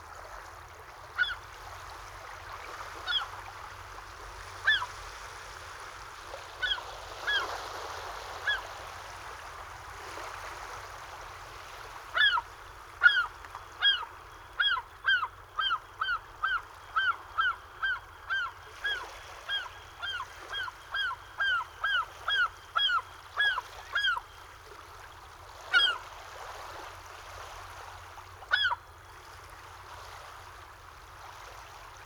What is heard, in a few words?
Ocean Shore Lap Gull Circles; QuickSounds